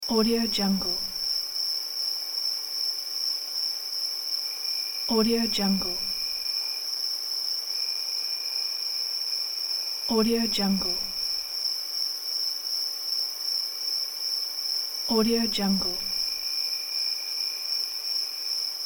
summer night loop

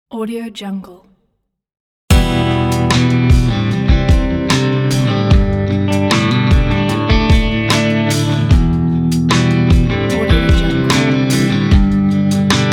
classic country rock ballad

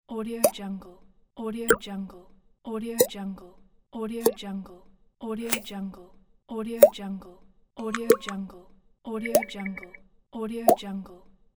plops buttons

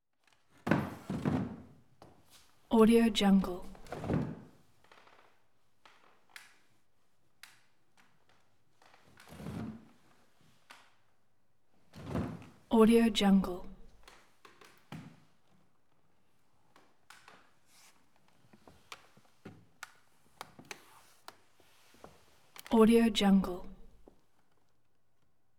dining room chair

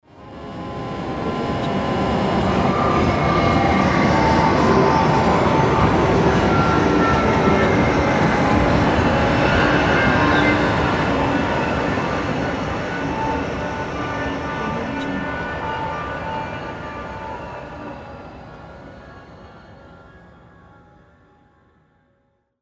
space ship engine

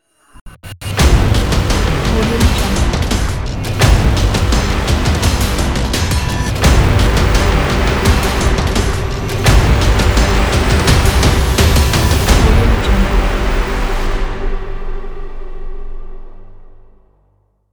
trailer intro logo

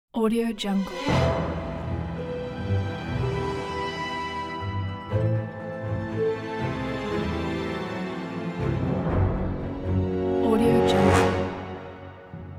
mafia commercial

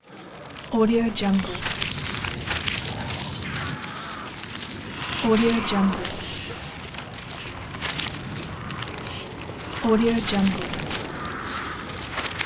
evil book pages

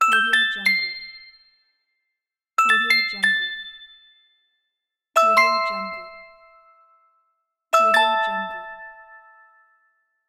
idea indication

